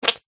human fart armpit

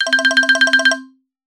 app alert tone ringtone

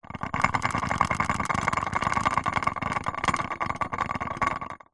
lamp plastic shake wobble loose rattle; household

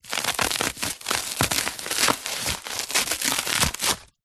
polystyrene piece scratch

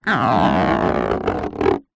balloon popped skin rubber stretch squeak

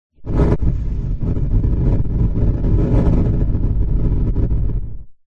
nature fire flame large burst

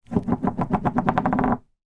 industrial masking tape roll place down on table

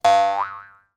cartoon spring bounce twang

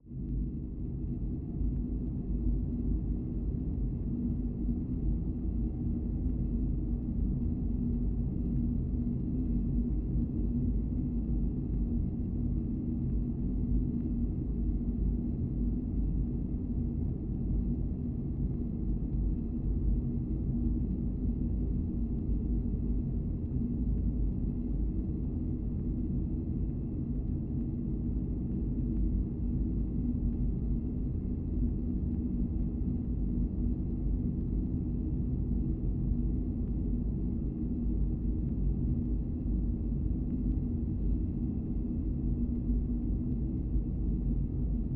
sound design room tone drone airy dark

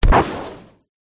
medieval fire arrow fire

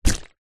hot water bottle rubber full water set down; household

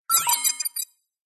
science fiction control panel beep alert

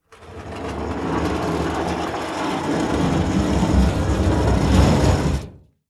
Just Metal Scrapes and Scratches; soundbits

